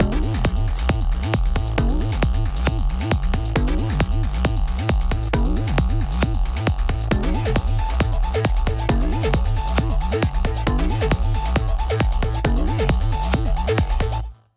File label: Logo Ident Modern Quirky Beat; SoundsCrate